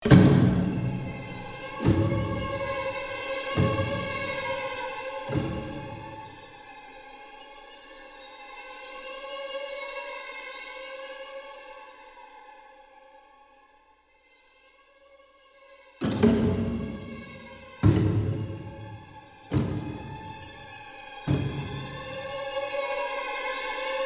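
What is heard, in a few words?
Music Creepy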